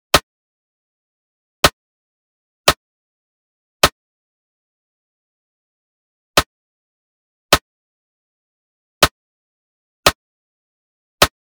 Drum Loop, 130 BPM Snare; Loop Stems; Cymatics; Vibes II Drum Loop Collection; Genie Drum Loop

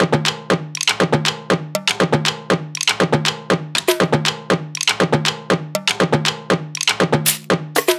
Perc Loop 120; Drums; Percussions; Loops; Blow; Ghosthack; Drum Mega Bundle; Abstract Percussions Volume 4

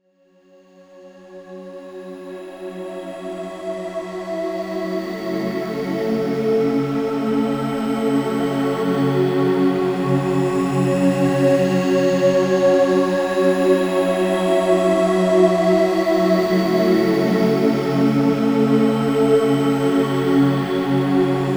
Pad F#; One-Shots; Pads; Ghosthack; Color Bass Essentials

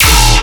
Bass Hit D#, Dubstep

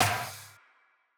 Snare, Dubstep Snares

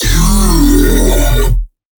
Bass Hits, Dubstep; Aquabot; Advent Calendar 2017 Day 21 - DubstepBass

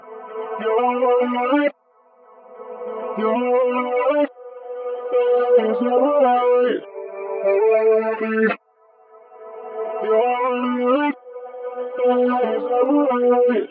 Reverse Vocal, 140 BPM E Min; No One Else; Cymatics; Infinity Vocal Collection

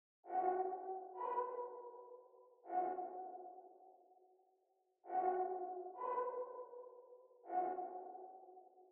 100 BPM D Maj Texture, melody loop stem; Cymatics; 2022 Melody Collection; Islands

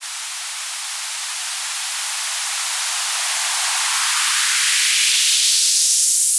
White Noise Up; FX; Cymatics; EDM Starter Pack

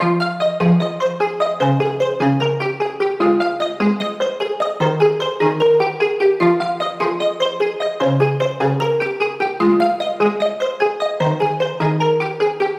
Future Bass Arp Loop - 150 BPM F Min, synths

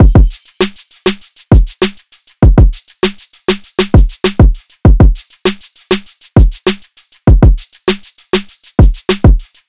Drum Loop - 99 BPM; Scorpio; Cymatics; Infinity Drum Collection

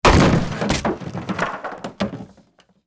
Slams Wood Table Debris

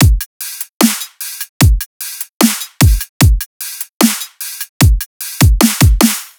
Dubstep Drum Loop Full, 150bpm; Stem Seperated

